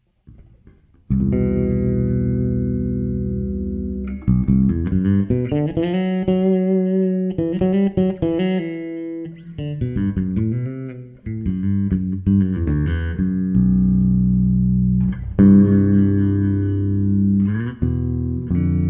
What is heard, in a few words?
improv, solobass